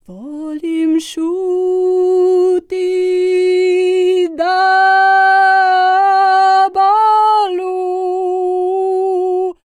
SERBIA, Vocal World Voices SERBIAN

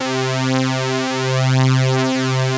POLY SYNTH; Wizoo - Powered Wave

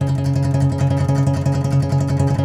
C. Bass Balalaika, Plucked Strings

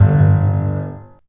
THUD, The Piano; InVision Interactive

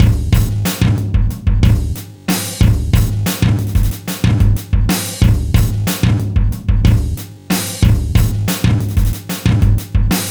92 swinging funk, breaks, Live Breaks

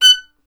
STR VLN, Solo Instruments; Miroslav Vitous

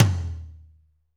TOM M R, Drumscapes Roland

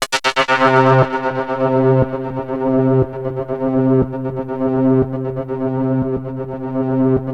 Access Virus B; Synthworx

